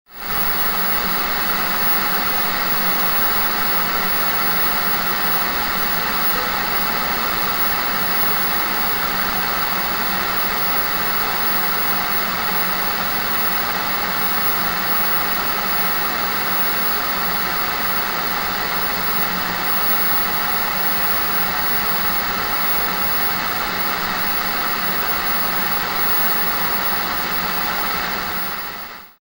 Technology Electronic TV Static

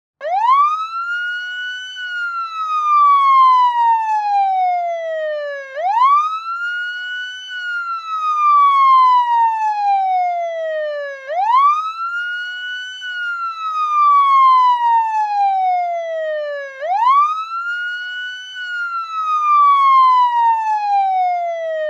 Emergency Siren Police Wail From Front

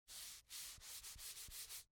Household Dry Eraser On Dry Erase Board

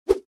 Weapon Whips

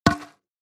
Impact Wood Drop

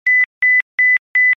Technology Electronic Cell Phone Ring Beeps

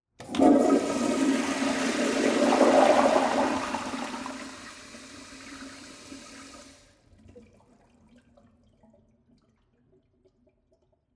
Liquid Water Toilet Flushing In Public Bathroom

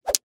Weapon Staff Strike Quickly

